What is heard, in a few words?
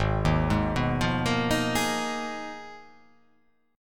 G sharp Minor 6th Add 9th